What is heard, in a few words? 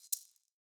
maracas Single Hit Left Hand; Raw Perc One Shots; Slate; SD SEISMIC Drum Sample Pack